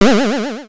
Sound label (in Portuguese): Cama Elast